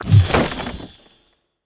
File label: match found; Golf Blitz 1.12